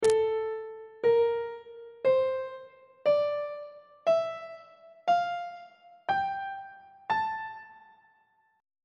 a phrygian mode on treble clef

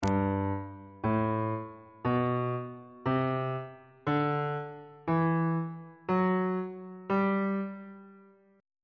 a double flat major scale; note positions on bass clef

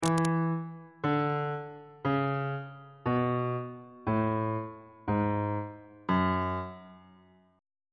g flat minor scale descending on bass clef

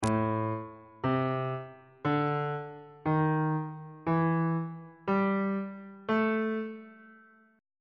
a blues scale; bass clef

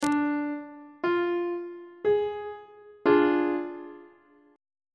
d diminished chord; treble clef

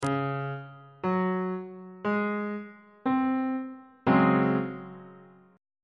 c sharp major 7th suspended 4th chord; bass clef

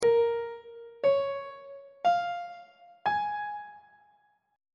e flat dorian 7th chord 5 is b flat minor 7th; on treble clef

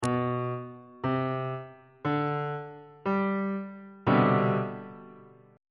b sharp major 7th suspended 2nd 3rd inversion

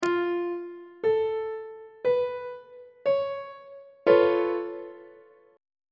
d flat augmented 7th 1st inversion on treble clef

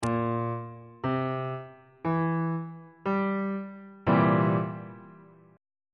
c dominant 7th 3rd inversion on bass clef

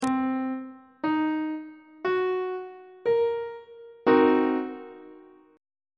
d double flat half diminished 7th chord; treble clef

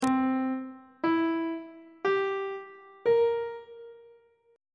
c dominant 7th chord intervals on treble clef

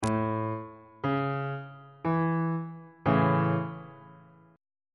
g double sharp major chord on bass clef

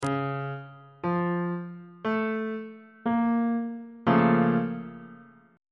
a sharp minor major 7th 1st inversion

